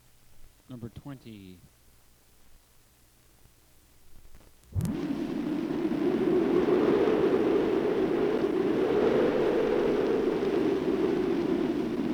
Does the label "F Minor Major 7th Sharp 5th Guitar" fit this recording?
No